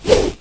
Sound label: fire, alien attack